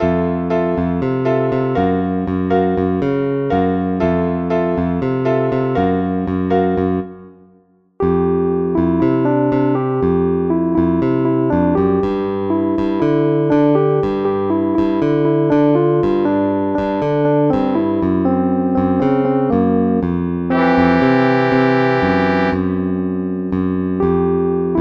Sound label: Jazz; Goucher Jazz